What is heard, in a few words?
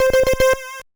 wave; BatsAndPray